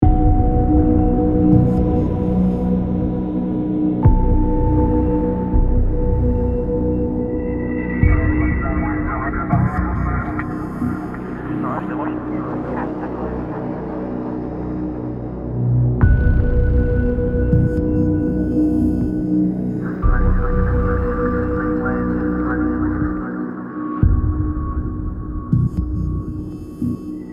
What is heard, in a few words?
Police Station Ambient